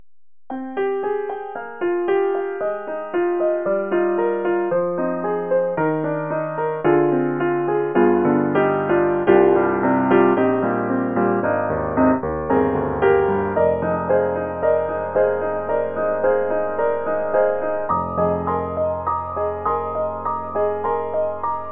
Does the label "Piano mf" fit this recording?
Yes